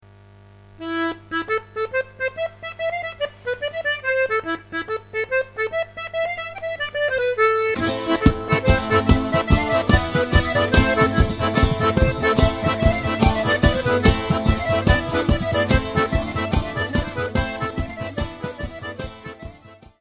Tarentelle Sicilienne, Italie

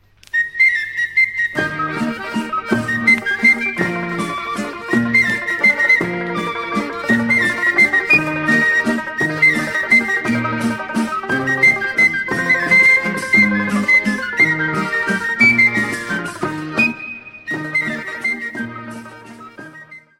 Mazurka - Italie

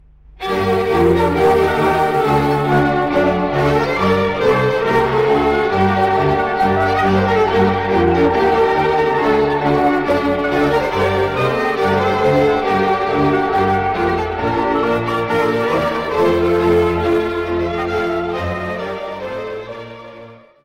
Cardas - Tchequie

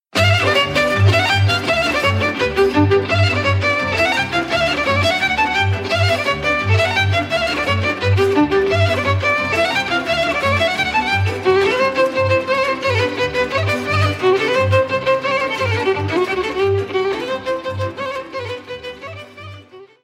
Ardeleana dance - Roumanie

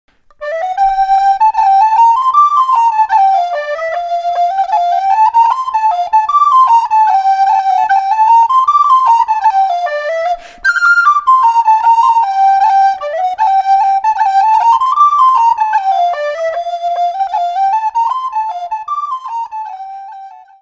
Hornpipe - Irlande

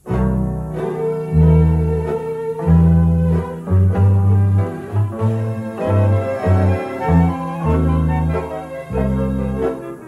Cucu de trei Zile, Roumanie

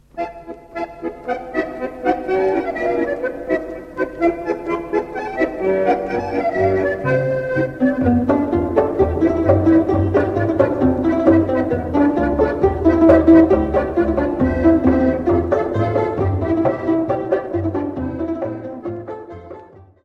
Krakowiak, Israel

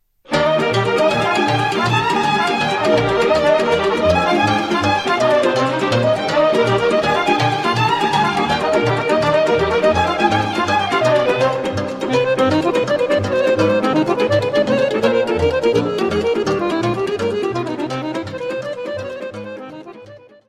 Sirba - Roumanie; Sirba Podgorenilor

